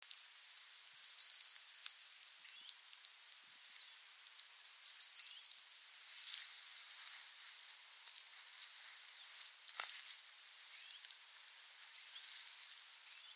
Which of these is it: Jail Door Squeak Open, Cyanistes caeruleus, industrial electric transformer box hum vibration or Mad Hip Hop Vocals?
Cyanistes caeruleus